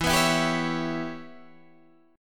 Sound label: C sharp Minor 7th Flat 5th E